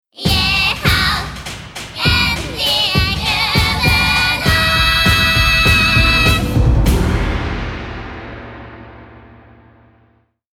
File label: Song Magic; ar tonelico ii melody of metafalica soundtrack